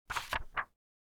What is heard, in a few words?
page flip